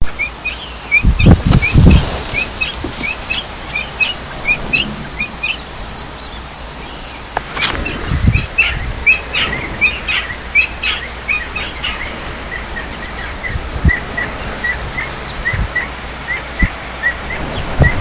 Colinus cristatus, Aruba; Malmok

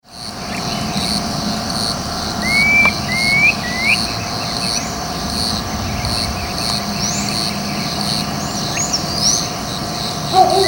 Phyllomyias fasciatus; Itap da Serra; SP

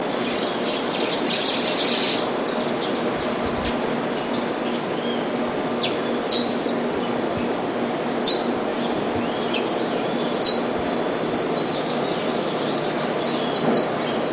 Pygochelidon cyanoleuca; I Comandatuba BA; Silveira

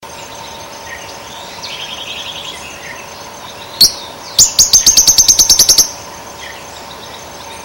Todirostrum poliocephalus; PN Itat; Silveira

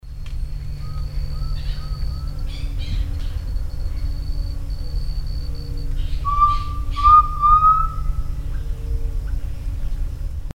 Crypturellus undulatus; Silveira

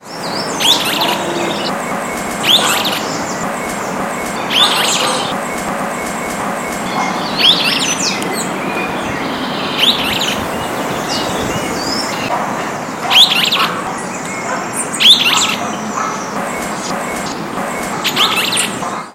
Myiodynastes maculatus; Jd Botanico POA; Silveira

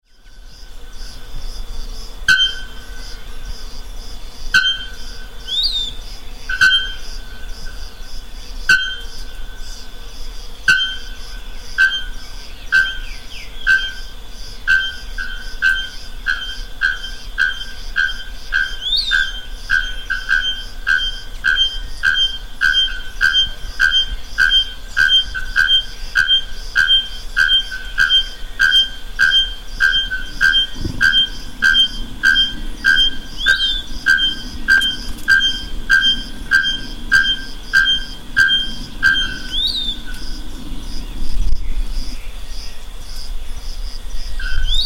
Procnias nudicollis; Intervales E Park; SP; Brazil; Silveira

